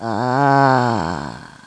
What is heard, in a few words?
GROWL